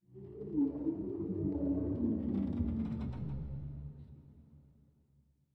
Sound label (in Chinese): 绯红森林菌类声; ambient